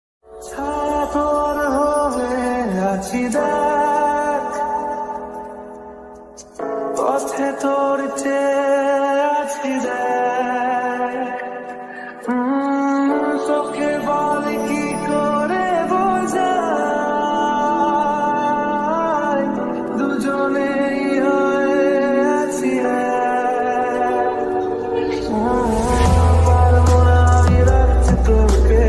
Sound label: Bengali Ringtone